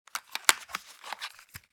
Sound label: Headphone adapter handling and movement; headphones and speakers